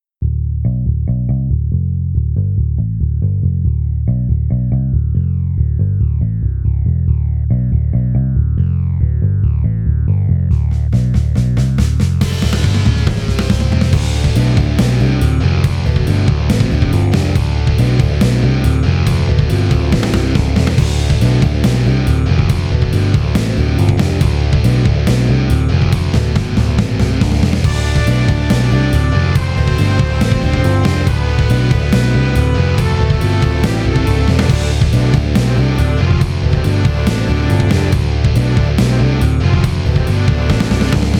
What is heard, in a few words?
Trailer Rock; garageband